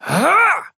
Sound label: effort melee big; Trapper